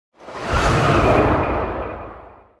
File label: Train By with Rail Clicks; Hollywood Edge Sound Designers Tool Kit